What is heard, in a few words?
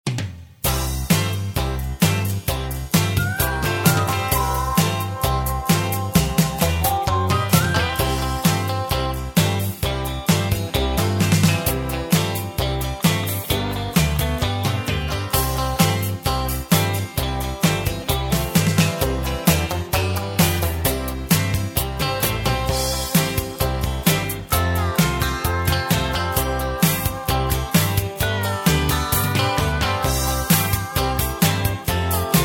music